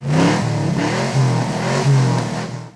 카트부스트

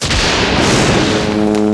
뇌격쇄

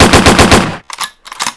래피드샤워

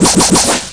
회오리차기, effect

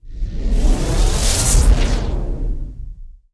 캐스팅

